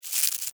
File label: Insect Move Bug, Insect, Movement, Bug; Blastwave; Animals:Insects